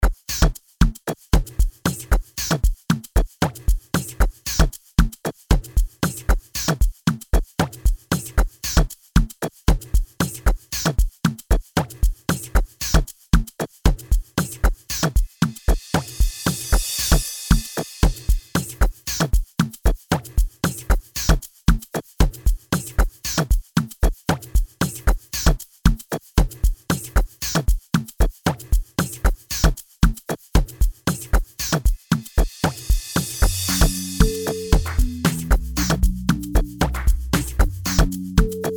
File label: urban broken piano